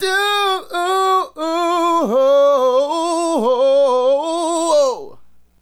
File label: Adlib, Hip-Hop and RnB Vocals